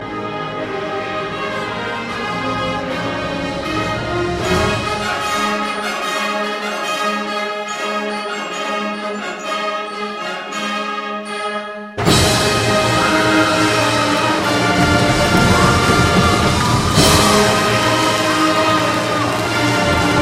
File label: Bris Concert